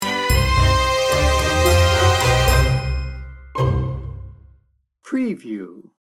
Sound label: tango sting bumper